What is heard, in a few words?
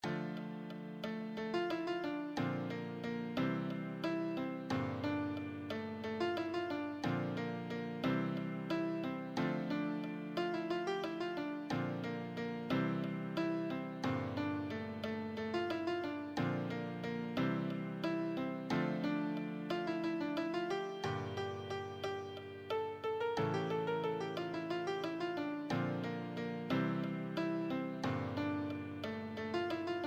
easy solo, easy piano solo